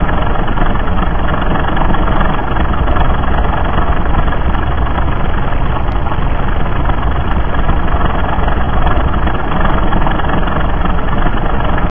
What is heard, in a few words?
leclerget blip, sopwith Camel; Bombable; flightgear; yasim